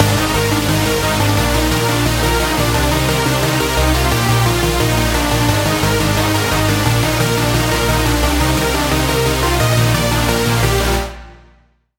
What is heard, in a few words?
Chord; Routed Sound; Spire Expansion - Flame Summoner Vol.1